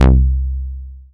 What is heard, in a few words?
Synth Bass, Roland